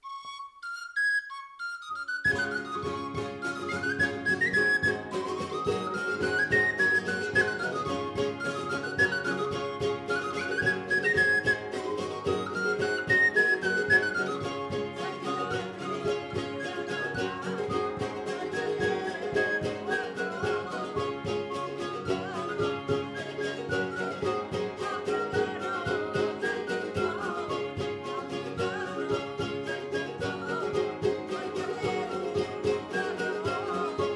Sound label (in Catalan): Jota amb flabiol